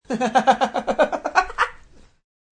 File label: Man Woodpecker Laugh, Male laugh; Laughter